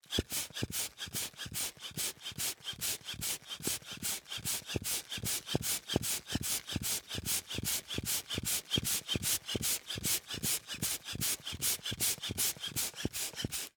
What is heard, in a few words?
Handbellows, leather, wood, brass, pumping, wheezing, blowing, supple; Eiravaein Works; Vaeyan IV